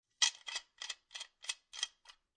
Flask Metal Close Twist Cap, Foley, Flask, Metal, Close Metal Twist Cap; Twist Sound Effects